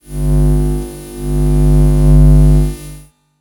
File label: electro magnetic interference; sonniss gdc